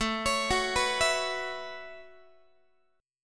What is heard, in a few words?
A 6th Add 9th A down Guitar; A6add9; arpeggiated